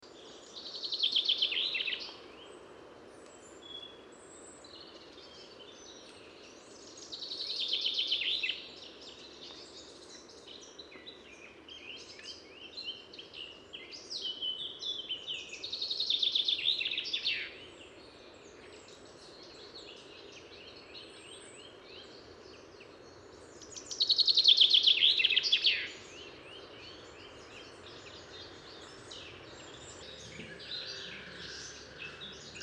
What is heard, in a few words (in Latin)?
Fringilla coelebs; SETE